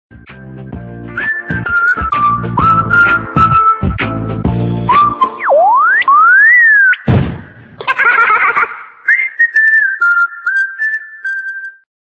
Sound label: wistle wistle